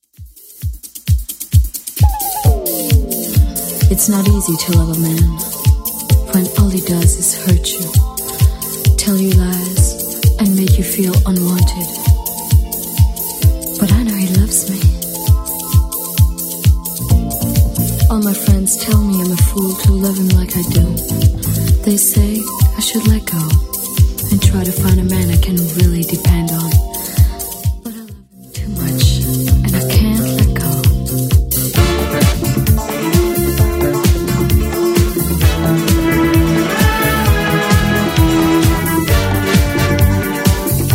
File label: 80s 131 bpm; Redrum